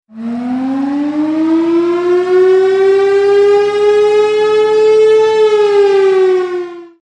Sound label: Super Loud Alert Tornado Alarm Sound Siren